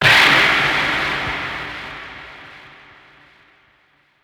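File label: shot from a space weapon; kosmicheskogo oruzhiya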